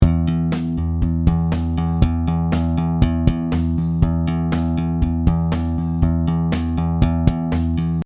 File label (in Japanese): キックとベースダイナミクス調整アリ